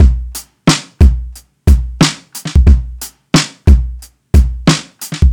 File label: Snare